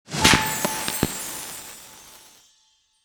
small Win Delay; netent

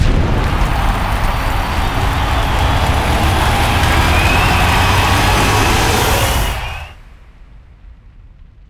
anticipation Riser; netent

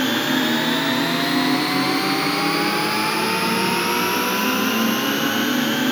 shepard

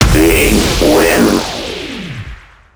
Big Win

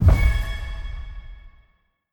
wild Land; netent

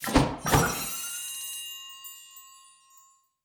mega Win Text Change; netent

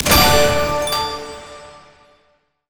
medium win

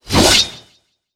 win sweep Horizontal; netent

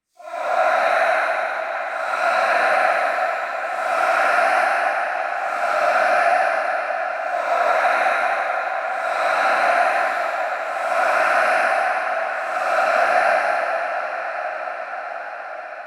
crowd chant Left; netent

